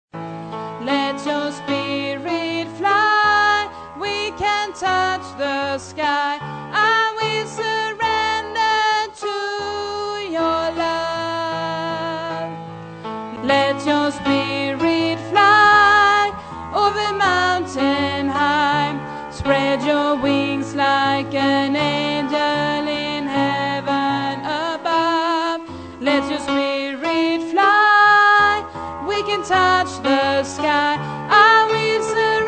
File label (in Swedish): alt, stammor